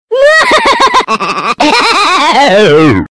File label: Twisted Laugh